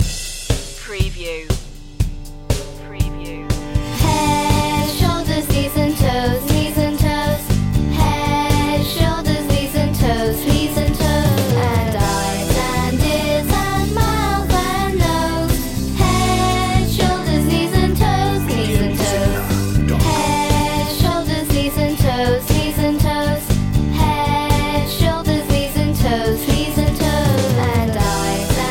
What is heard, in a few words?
heads shoulders knees and toes children vocals